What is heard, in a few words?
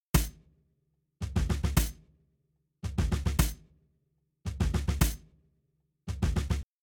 Drums, shuffle drums; WDR2